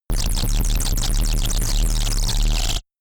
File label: space charged laser blaster overload big; krieg-waffen; soundpool